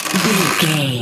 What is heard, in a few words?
tape gun dispenser streching taping sealing